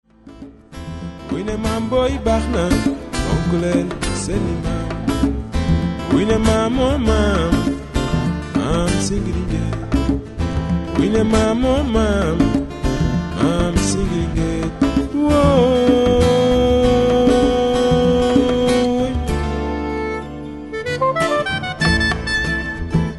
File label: Senegal Acoustic